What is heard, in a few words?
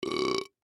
burp